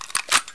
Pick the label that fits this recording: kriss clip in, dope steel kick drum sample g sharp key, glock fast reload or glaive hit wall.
kriss clip in